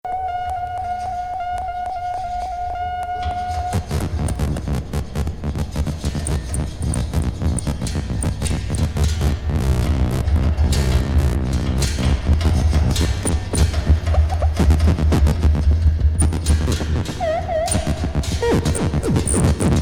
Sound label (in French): rock electronique